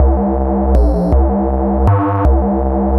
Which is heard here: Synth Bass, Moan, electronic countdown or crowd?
Synth Bass